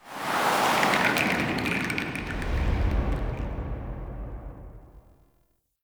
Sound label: Town Reveal; Warframe; Awakening Remaster